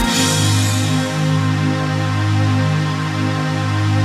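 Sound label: chord pad, Pads; Best Service; XX Large - Pads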